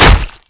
cleaver hit bod, weapons

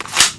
smg clip release

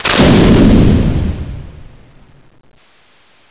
beam start

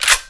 spas pump back, weapons

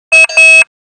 radar warning

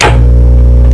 button

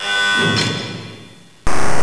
flamethrower

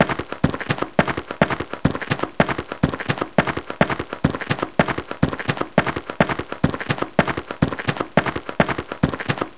walking

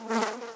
flies, ambience

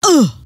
pain, scientist female

